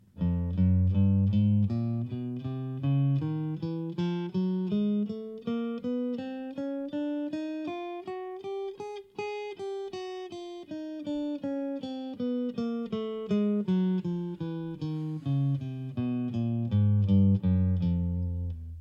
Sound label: Warm Up, guitar